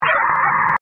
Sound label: doop doop Eb; spooky saturn